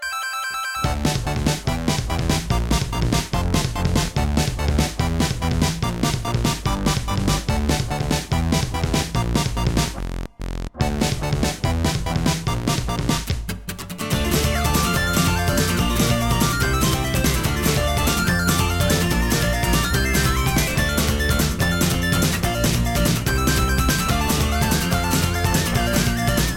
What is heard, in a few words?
Ingame Feverloop; Starvsevil; DisneyNOW POP